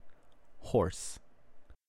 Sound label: Horse